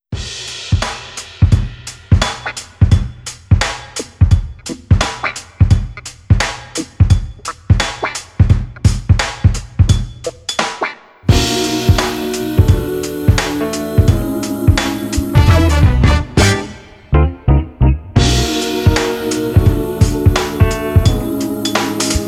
rimshots and basses

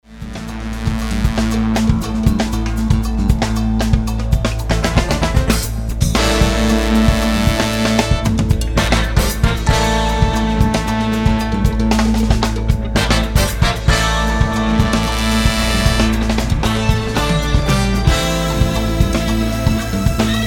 japanese instrumental live version

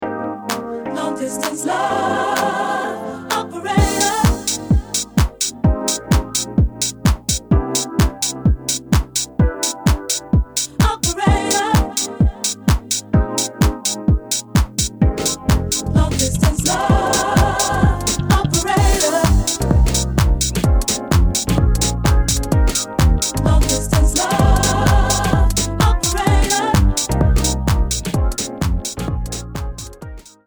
vocal mix

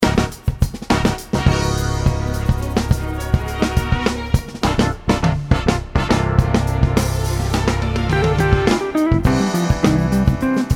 instrumental

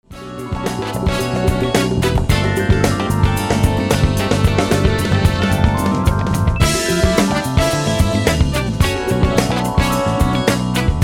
instrumental version

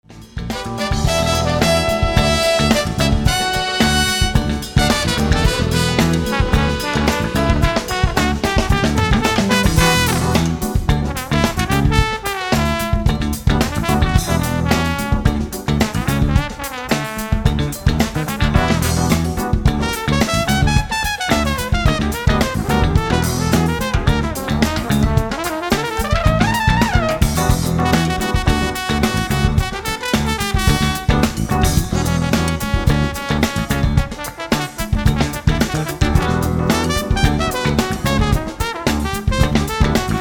japanese instrumental live version

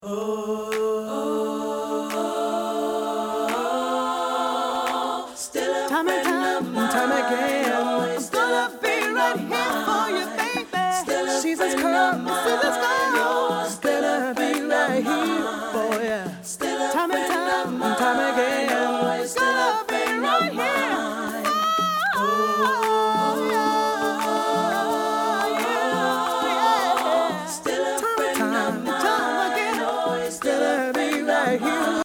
acapella

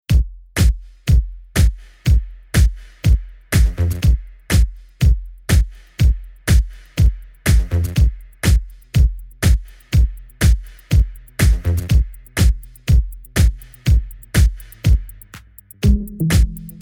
symmetrical dub

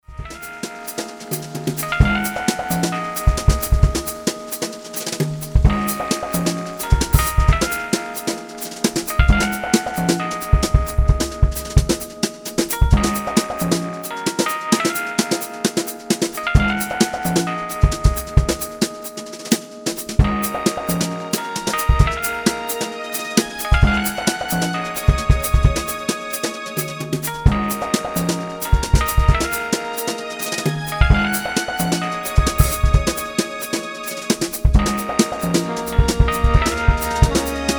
instrumental version

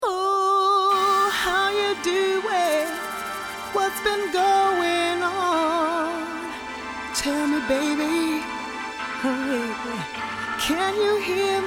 vocal mix

